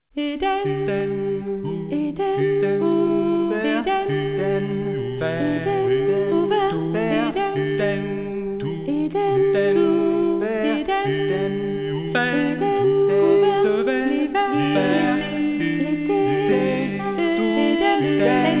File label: vocal